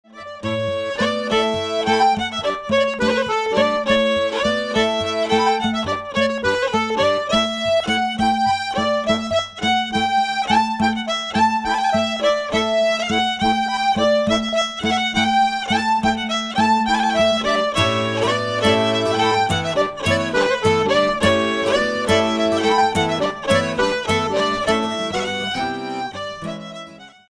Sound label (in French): marchoise; Magne